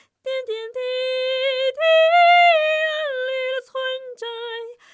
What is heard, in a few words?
Soprano